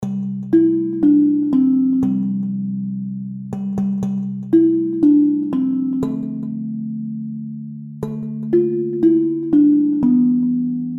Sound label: Jingle Bells